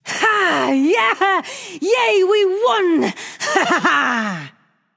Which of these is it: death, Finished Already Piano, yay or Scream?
yay